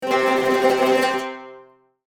Lute tremolo short

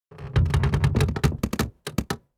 Wood short and heavy creak earthquake designed

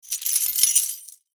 Keys handle and rattle; locks keys

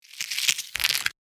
Egg breaking crack cooking

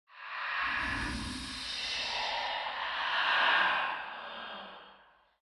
Slow ghost passing

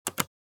Laptop keyboard keystroke pressing spacebar

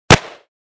Pistol springfield xd 45 single shot

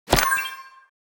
Button positive notification

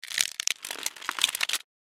Chocolate wrapper foil wrap close; paper sound effects

